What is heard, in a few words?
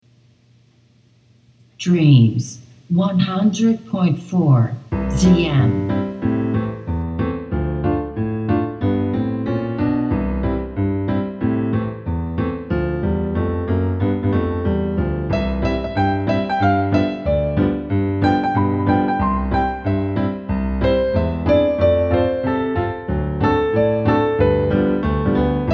Chilled Hawaiian Water